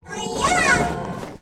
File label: RECRUIT ATTACK; BARAS; Mob